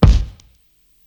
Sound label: Kick, Psychedelic Drums